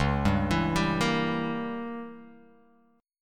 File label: C sharp Diminished 7th C sharp down Guitar Open F; C#dim7 arpeggiated; JGuitar